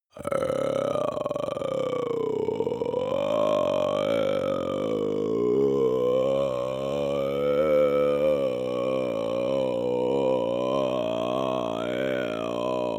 male growl larynx long; menschen; koerpergeraeusche; soundpool

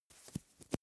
makeup cotton stick clean; haus-garten; badezimmer

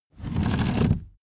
car seat slide backward fast

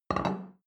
plate place table soft short, gastronomie; berufe; soundpool

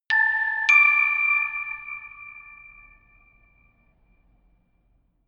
notification glockenspiel creepy fourth up

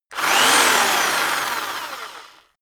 rock drill rev medium medium; maschinen; bohrer

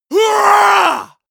male combat attack long

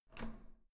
door handle up

